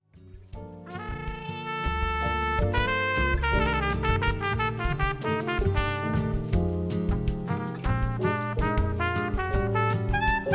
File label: Bossa Nova